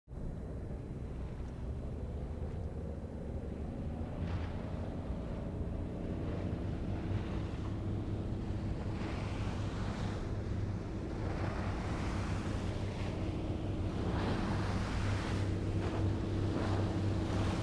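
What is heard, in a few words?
Coast Guard Cutter Ship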